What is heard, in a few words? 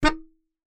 Melodica Short Note